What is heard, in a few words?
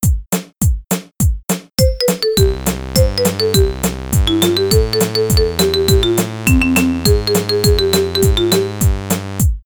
Lost Mexican Song with bass